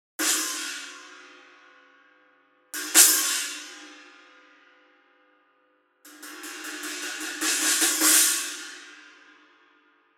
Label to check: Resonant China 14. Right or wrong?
Right